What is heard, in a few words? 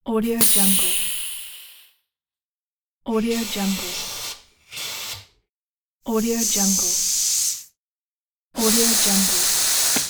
pneumatic air pressure release